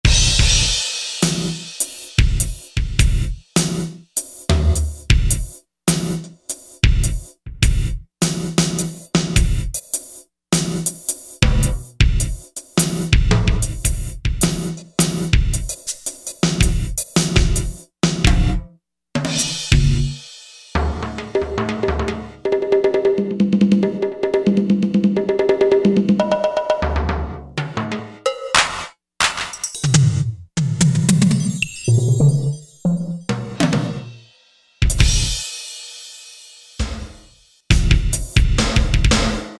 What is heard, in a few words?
Drums, Korg MSC-6S; Fretted Insts 1